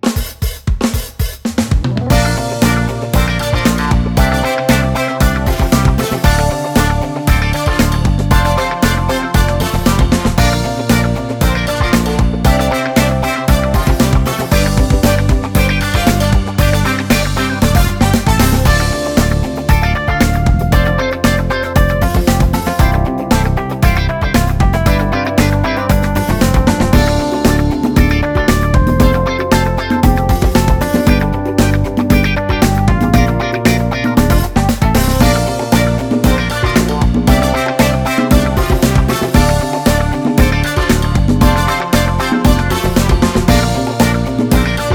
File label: Summer Funk; Meow Motors